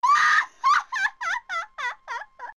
Scream; Great Fairy

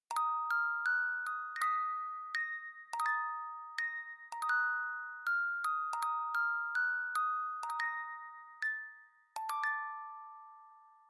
dead music

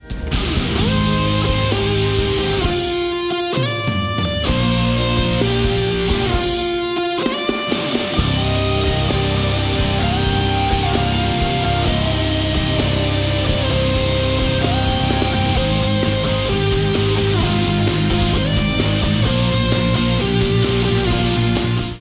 METAL

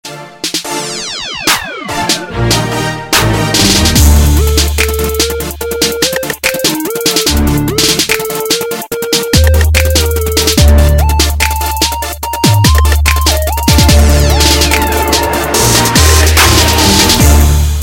hip hop

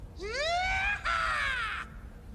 HEEE HAAA; Lando

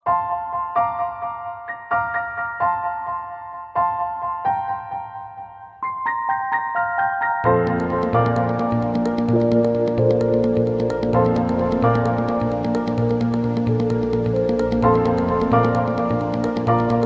Piano

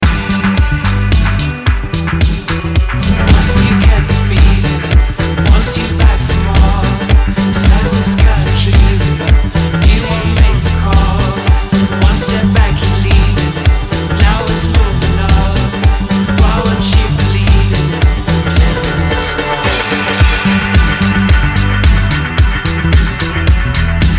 bombay music; rnb

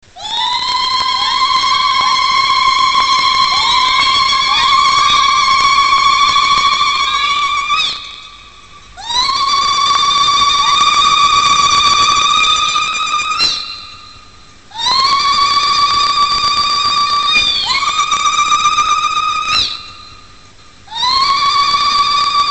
girl sound; ringtones

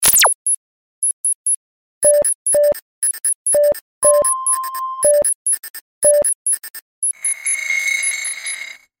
sony beeper

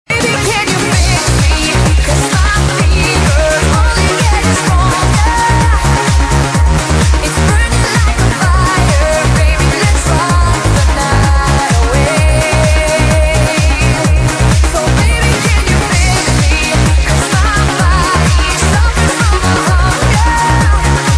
Electronica

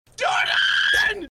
Scream

